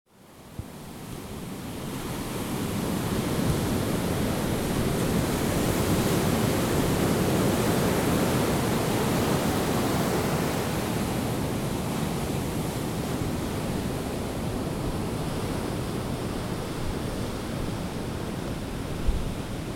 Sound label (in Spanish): Soundscape Mar de Gandoca y Carro